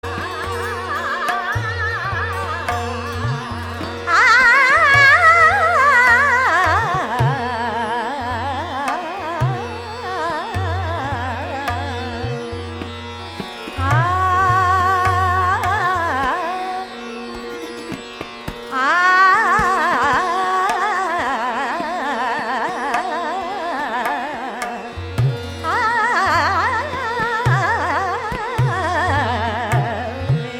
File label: INDIAN VOCAL FEMALE VOCAL